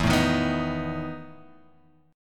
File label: E Major 7th Suspended 4th E, Guitar, Open G; chord shape